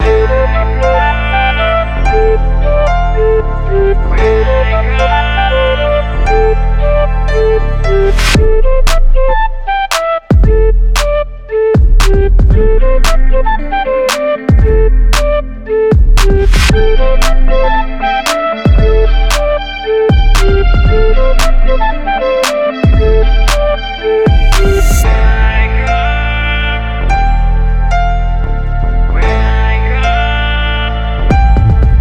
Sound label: Type Beat BPM115 Amin